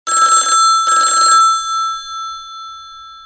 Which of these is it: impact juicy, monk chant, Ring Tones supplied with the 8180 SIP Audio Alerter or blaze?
Ring Tones supplied with the 8180 SIP Audio Alerter